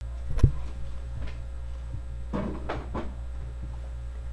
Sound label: stab